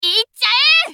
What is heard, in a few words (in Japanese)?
攻撃; カルヤライネン